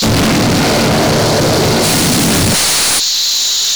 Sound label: white noise; Rumbles